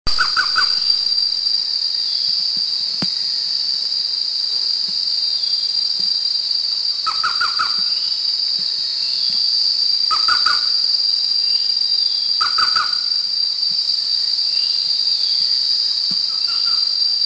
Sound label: Orange-breasted Trogon